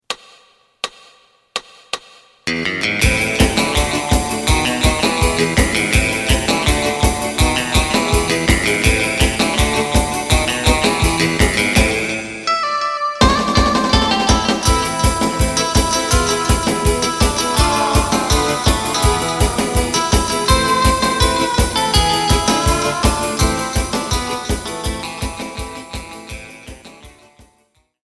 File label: bass